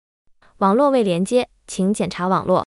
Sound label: net not connected, tone